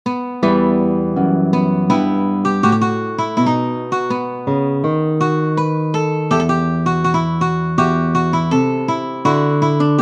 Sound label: Sonata Allemande andante, guitar; Weiss